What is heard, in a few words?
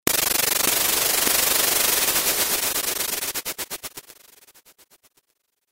underwold noise; Sound-Graph